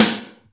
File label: SNARE SPAC; ProcessedEl.Kits